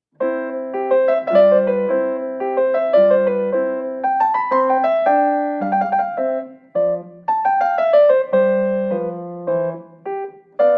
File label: menuet and trio